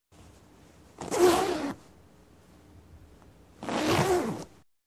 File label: Jacket zippers